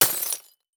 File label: Impact Mallet Hit Glass Debris Beer Bottle